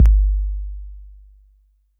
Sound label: Roland 808 BD; Drums; Tr-808